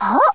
jump; svencoop; player; hoshino

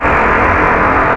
damage, items; svencoop; quake2

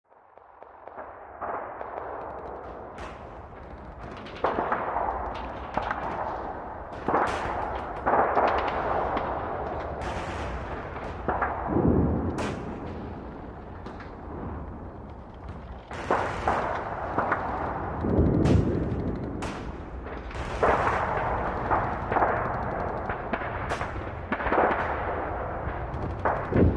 ambience; svencoop; urbicide

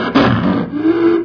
alert, zombie; svencoop; hl2

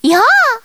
bash; svencoop; hoshino

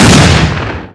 fire colt; svencoop

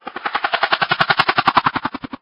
warning, poisoncrab; svencoop; hl2

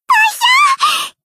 cheer; svencoop; voice commands; hl Nozomi

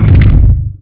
door stone stop; svencoop

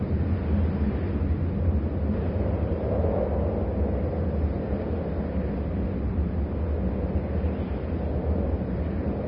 town ambience; svencoop; hl2; ravenholm

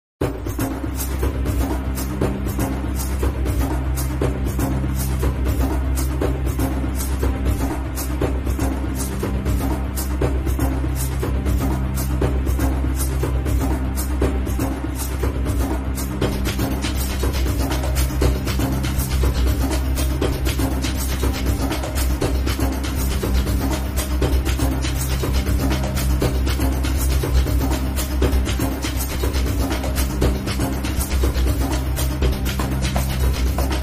fight; svencoop; srsam alpha; egypt karnak

